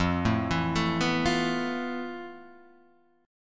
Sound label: F Major Sharp 11th, Guitar, Open D; down; chord shape